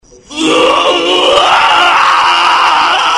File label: oooooooouuuuuaaaaaggghhhh